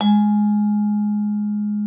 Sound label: VIBES G#3; Xsample VOL-4